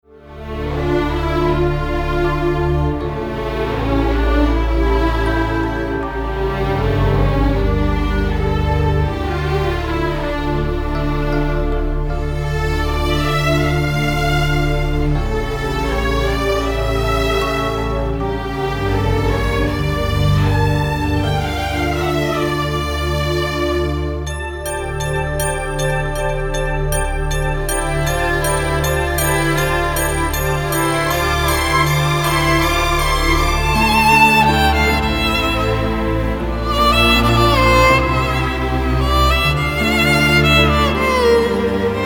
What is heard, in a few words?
Instrumental